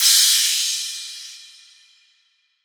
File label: Crash; Cymbals